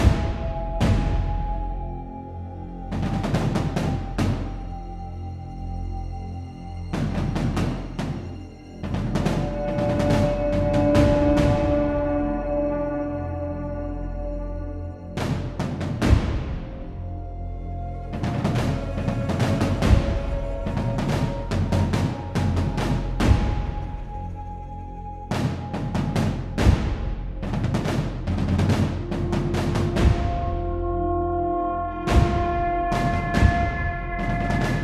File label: Orchestra & Drums; Moulton's Hill; Assassin's Creed III